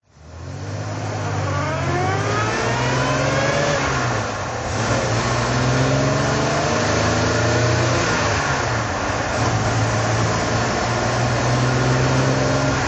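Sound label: healey 3000 drive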